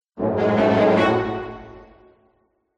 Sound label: MUSICAL ORCHESTRA Jingle Strings Fast Run; smartsound